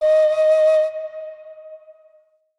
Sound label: Flute Alt D Sharp; Player Instrument